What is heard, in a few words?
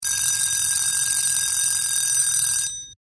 timer